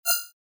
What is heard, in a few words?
roulette; LG GD900 Crystal; Game Sound